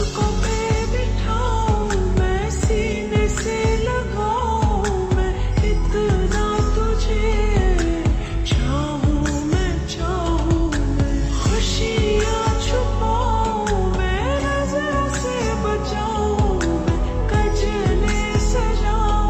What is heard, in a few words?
Ringtone Slow Reverb